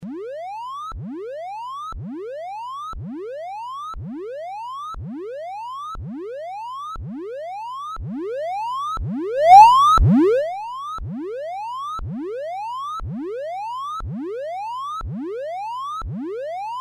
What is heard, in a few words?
1kHz; Plague Bearer